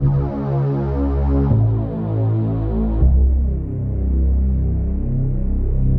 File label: Darkstring Bass, Drum N Bass; Sonic Foundry (Sony Creative Software); Knutrix Drum & Bass